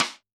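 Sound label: Snare Stick; drum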